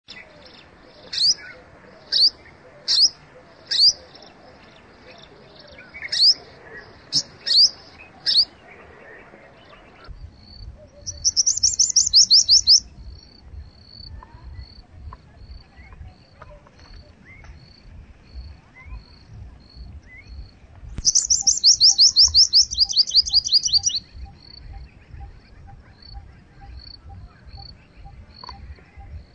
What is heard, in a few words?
purple sunbird